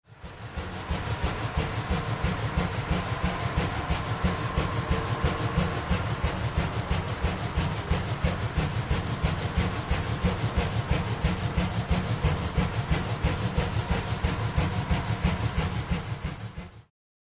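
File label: Air Compressor W Machine; air compressors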